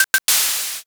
lighter

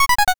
stairs down

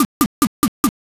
vegetable cut

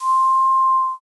flute

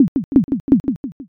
slot stop

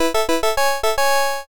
charge trumpet

